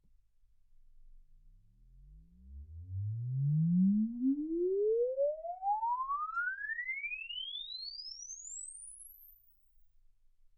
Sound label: exp swept sinus 10Hz 24kHz